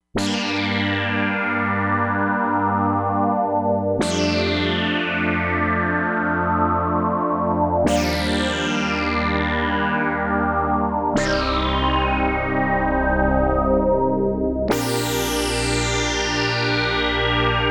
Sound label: RESONANCE SWEEP, Crumar Bit 99; Factory Programs